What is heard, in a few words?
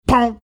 making spring door popping sound